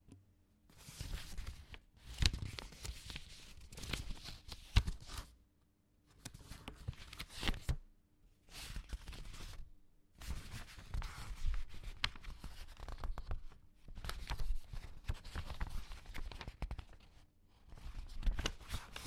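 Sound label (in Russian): бумажные листы перемещаются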